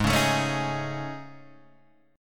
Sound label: A Major 7th Flat 5th